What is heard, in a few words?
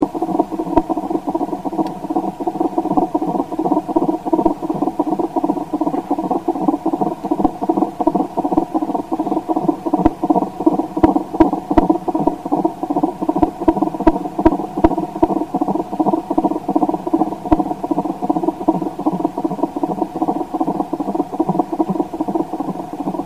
heartbeat racehorse